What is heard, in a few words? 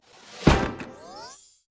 box entering main menu; ringtone; Mini Militia